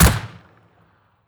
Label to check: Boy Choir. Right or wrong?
Wrong